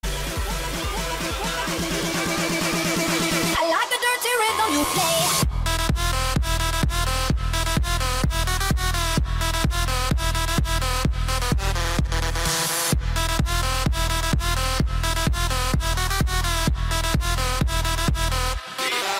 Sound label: classical ringtone